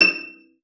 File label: CELLO FINGER; 90 s Sample CDs; Xsample VOL-5